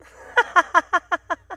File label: happiness